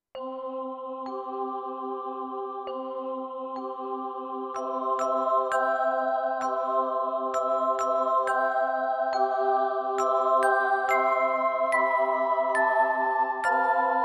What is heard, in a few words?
Chorus Bells